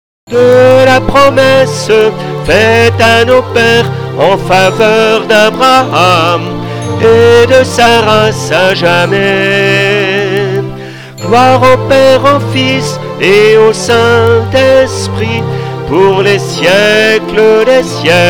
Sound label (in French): DUO soprane